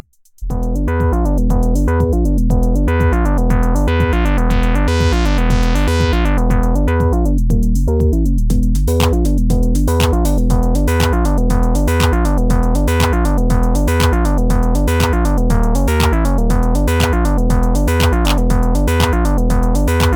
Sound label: Novation Circuit Mono Station; Factory Session